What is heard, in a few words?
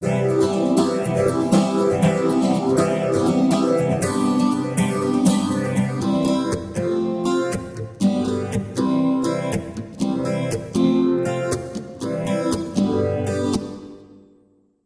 Phase shifter + reverb